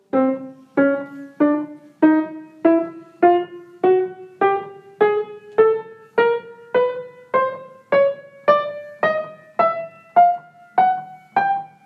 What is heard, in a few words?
piano chromatic